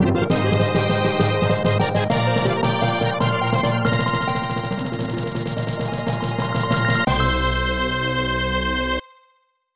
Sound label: Grand Victory; energy breaker original soundtracks